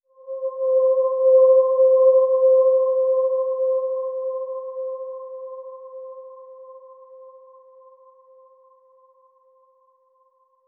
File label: PAD Eerie - C, Synth One Shots